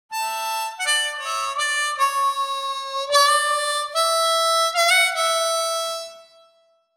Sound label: Harp; Verdigris Music